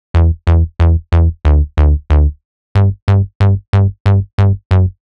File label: TEK BASS